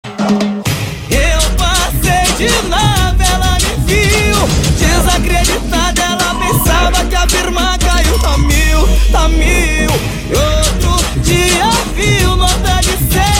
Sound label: music